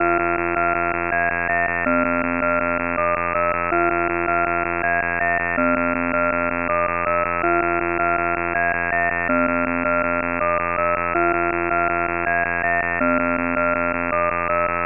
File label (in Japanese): OFDM, 変調方式: 64QAM